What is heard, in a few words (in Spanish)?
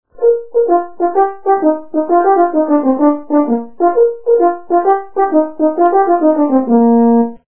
trompa